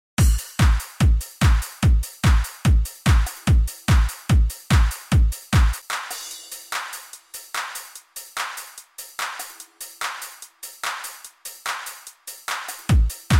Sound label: techno